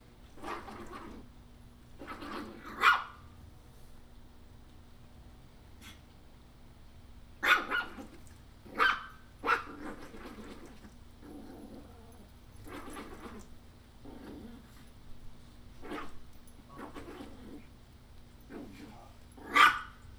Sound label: Lil' Pup Barking And Snarling; Running Errands On A Rainy Day